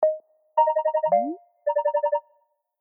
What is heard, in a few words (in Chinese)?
颤振; Teams; Classworks